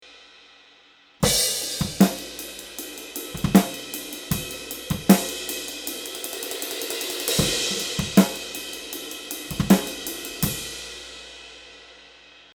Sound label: Section B OH